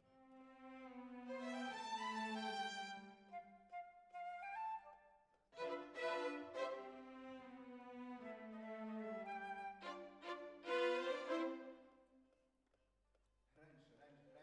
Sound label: Violins, jazz for piano